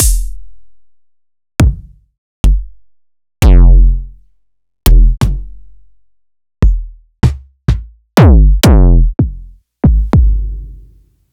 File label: kicks